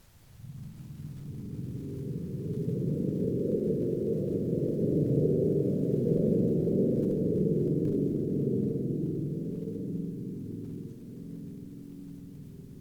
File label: spring